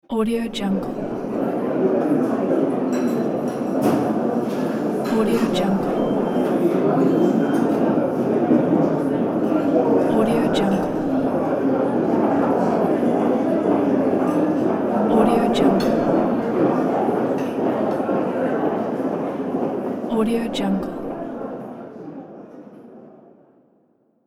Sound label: people ambience blur